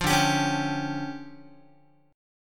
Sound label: D Minor Major 11th, down, Guitar; DmM11; strummed